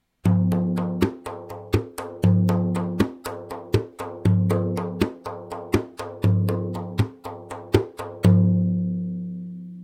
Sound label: tar